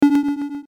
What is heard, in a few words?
notification